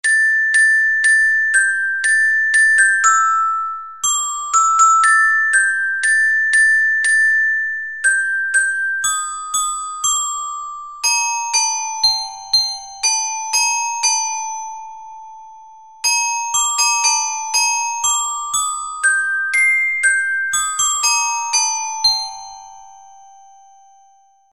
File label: teruteru, orgel